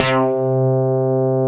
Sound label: MICRO BASS C4; Greytsounds; Scott Peers Sound Engineering